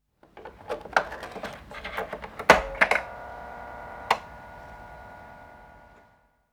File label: rustle boot; BoSLOO; GroundControl